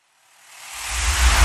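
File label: Reverse Snake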